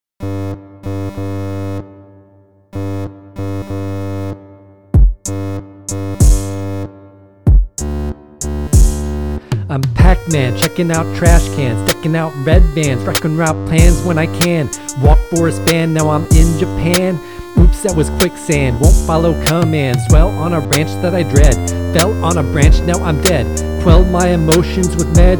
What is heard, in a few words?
rap; pac man 2 the new adventures